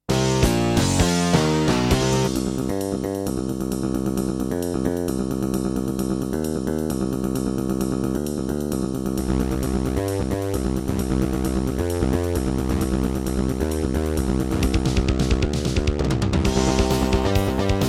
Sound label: Trust Korg Super Sound Module; sigil doom episode 5